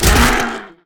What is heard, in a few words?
NPC Killed